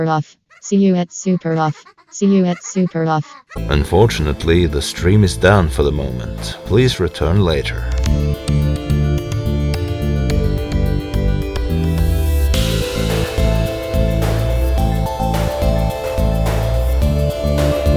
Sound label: ahaha